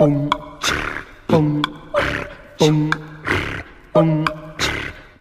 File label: bumba chee, Beatbox, Drum loops